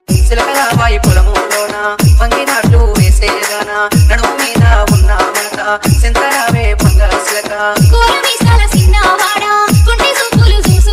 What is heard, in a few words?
dj bgm ringtone